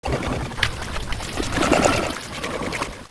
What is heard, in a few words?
gonome eat; svencoop; cryocell